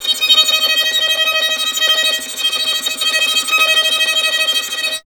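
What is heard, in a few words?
string violin tremolo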